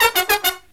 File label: Killer Brass Riffs, SOUL